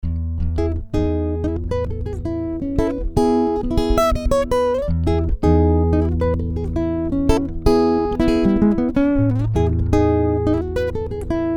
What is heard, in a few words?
jazz